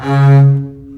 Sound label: String Collection, MARCATO; East West - The Ultimate String Collection